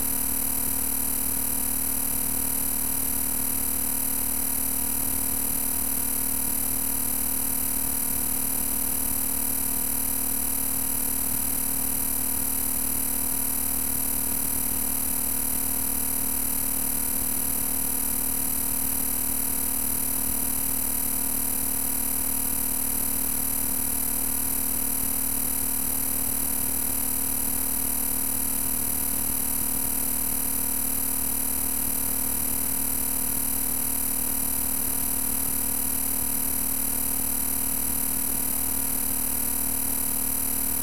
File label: Circuits Electric Hum Buzz Noisy Digital